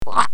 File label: slimy monster or murderd sound